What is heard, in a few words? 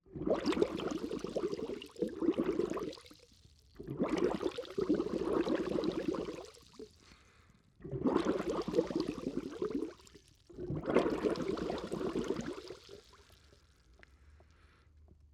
Underwater Movement; TheWorkRoom Audio Post